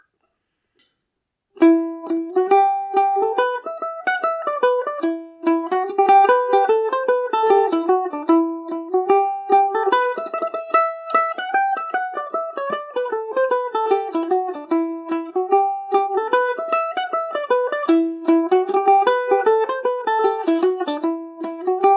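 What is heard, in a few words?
E minor, Irish mandolin; dunmore lasses revisited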